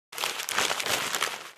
Garbage bag rustling handling

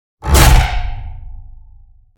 Horror accent jumpscare suspense short

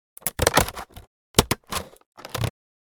Scissors cutting plastic; gfxsounds

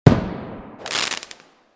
Fireworks single explosion burst and crackle

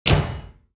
Hit on broken piano keyboard

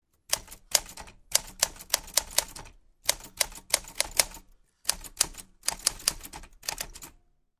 Smith Corona 1940 vintage typewriter typing

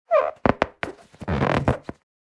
Inflatable toy creaking surface squeak

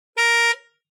Car single quick honk

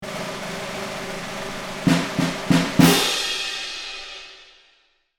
Marching field drum riff

